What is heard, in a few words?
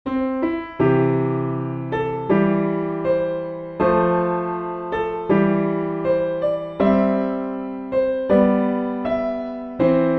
piano backing, hymns; recorder; there is a fountain